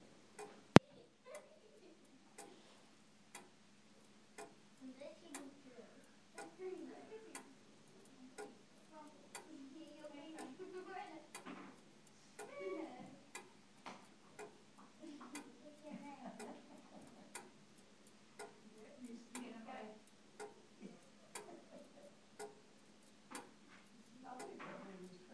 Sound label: great gran s grandfather clock